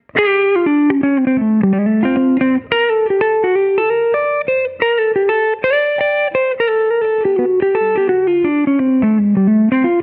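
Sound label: blues lick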